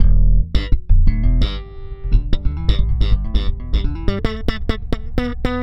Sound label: Slap, Bass; Bass X